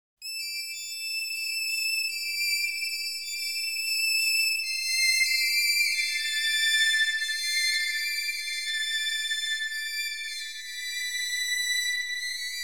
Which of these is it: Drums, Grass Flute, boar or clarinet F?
Grass Flute